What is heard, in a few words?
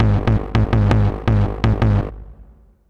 Dark DnB Bass